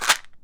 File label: Multivitamin Shake, pill bottles